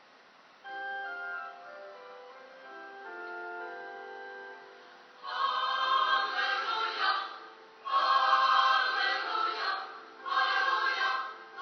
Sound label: Song